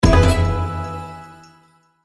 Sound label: multiplier win; Eternal Wishes LuckyTap